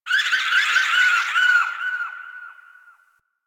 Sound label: Venus Distant Creature Alien Night, Ambience; Warframe; Venus Open World; Venus Landscape